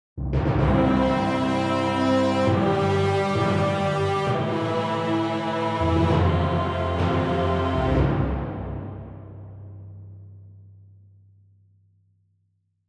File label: Orchestral Hall 2 4Sec